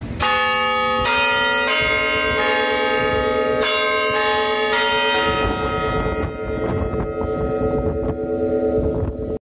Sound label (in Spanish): reloj; pachuca